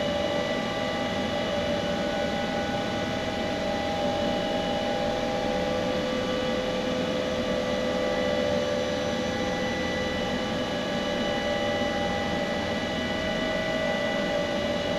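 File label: H145 Ground Idle, helicopter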